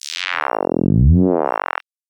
psytrance fx

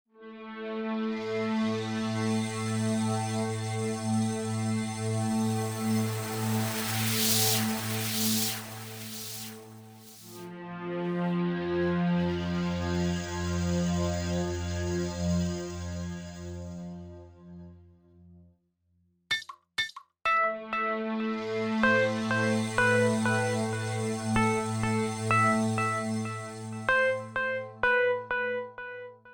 Rainforest

